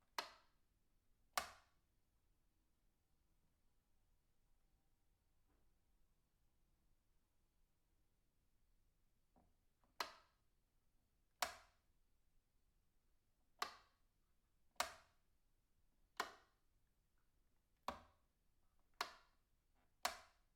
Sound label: wall light switch medium